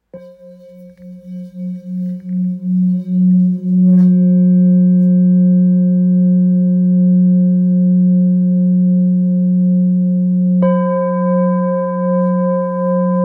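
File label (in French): Bol thick fa, bols chantants tibétains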